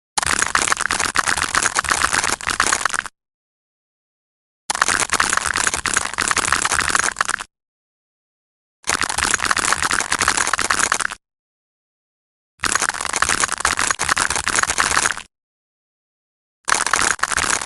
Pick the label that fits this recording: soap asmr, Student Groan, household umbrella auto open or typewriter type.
soap asmr